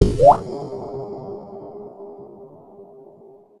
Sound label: weird; Best Service - XXL Production Effects